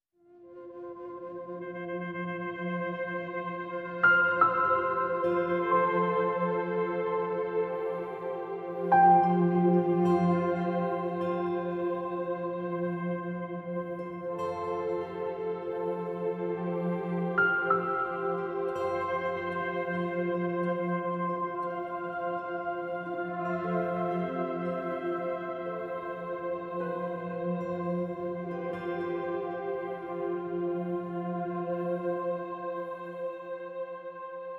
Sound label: Ambient